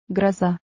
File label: Groza